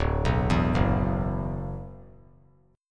D 6th Add 9th D; D6add9 arpeggiated; 2,6,7,4; JGuitar

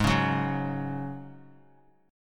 G sharp 7th Suspended 4th G sharp; G#7sus4 strummed; 20,20,21,23; JGuitar

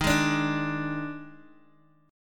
Eb Major 7th Suspended 4th, Guitar, DADGAD

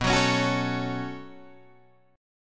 B 7th Suspended 2nd, Guitar, Open D

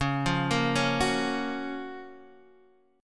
F sharp Major 7th

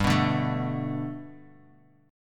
G Major Add 9th chord; Gadd9 strummed; JGuitar

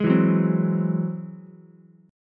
G sharp Augmented 7th G sharp Ukulele; G#+7 strummed; 1,4,2,3; JGuitar

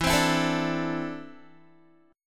E 7th Suspended 4th Sharp 5th, Guitar; E7sus4#5; strummed; 12,15,14,14,14,x

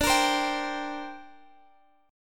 D 7th Suspended 4th Sharp 5th, D

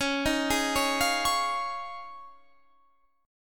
F Minor 7th Sharp 5th C sharp

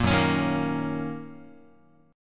Gb 6th Gb down Guitar; Gb6 strummed; JGuitar

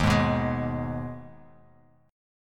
Eb Suspended 4th Sharp 5th down Bass Standard; Ebsus4#5 strummed; JGuitar

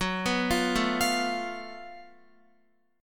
Gb Minor Major 7th Double Flat 5th, Guitar, chord; arpeggiated; x,9,9,10,9,12; JGuitar

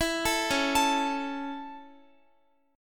Db Minor Sharp 5th Guitar; arpeggiated; x,x,14,14,13,16; 4E,4A,5D,5G,5C,6F; Dbm#5

